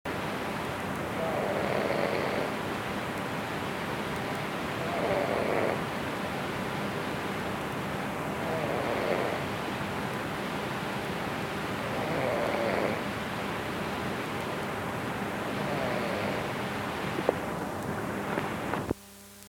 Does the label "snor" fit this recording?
Yes